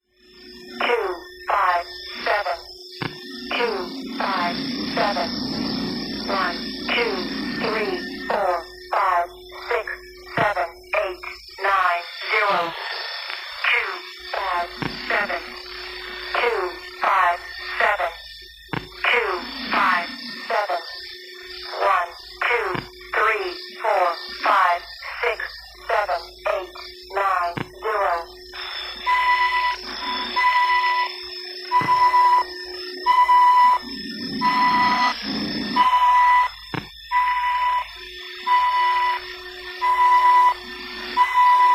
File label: robot code, science fiction